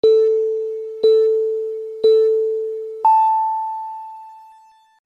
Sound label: rhythm countdown, clocks